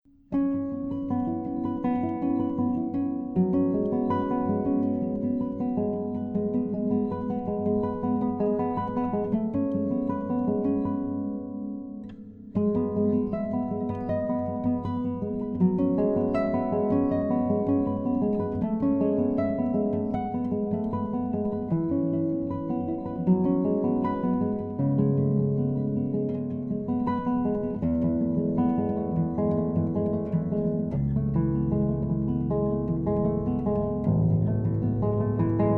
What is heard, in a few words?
string